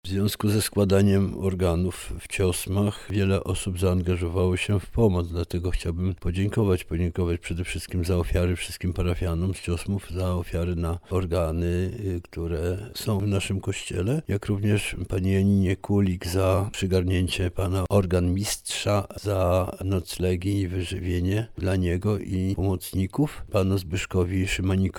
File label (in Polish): organy